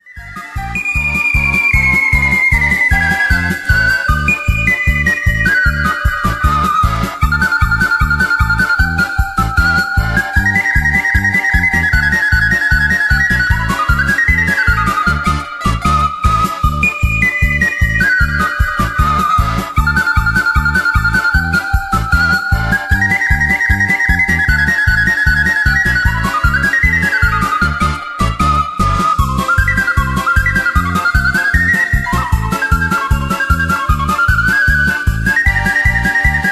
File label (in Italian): Zufolo Impazzito